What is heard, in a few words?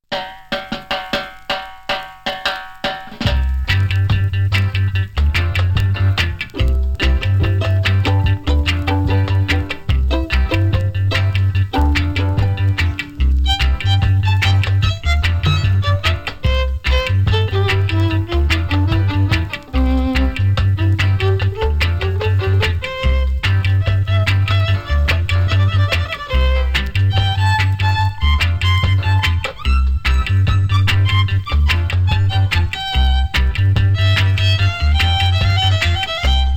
violin rock